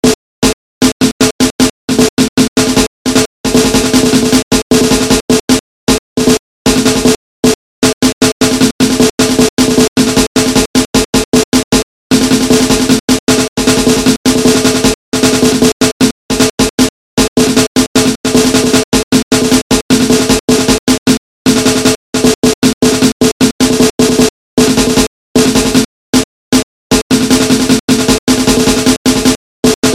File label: loops snares